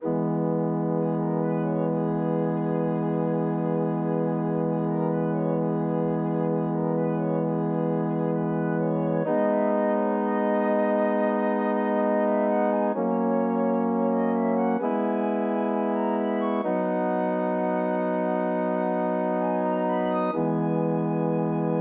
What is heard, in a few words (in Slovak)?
perinbaba flauta, Romantická skladba